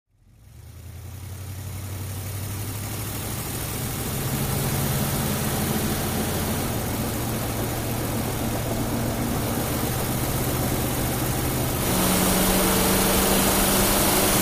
Wagoneer: On Board Aggressive Driving, Jeep Wagoneer